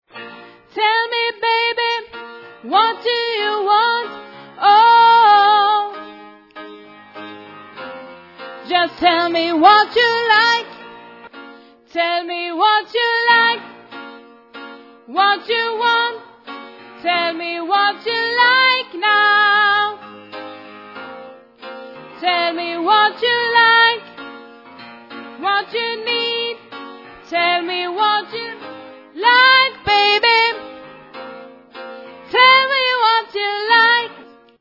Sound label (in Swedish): bas, stammor